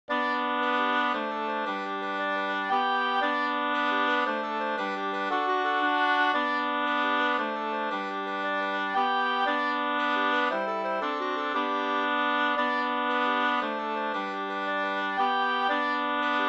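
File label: polska, two-part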